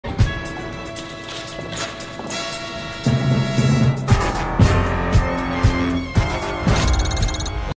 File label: sus music; stokes twins